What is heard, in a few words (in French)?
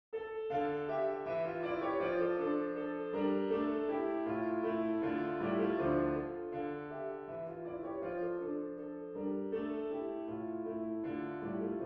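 bourrée mineur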